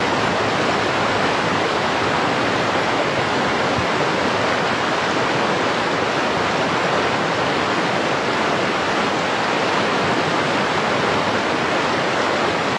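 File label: Ambient Waterfall Loop; silent hill homecoming 2008 gamerip pc ps3 x360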